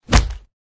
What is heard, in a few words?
slap; smite; odin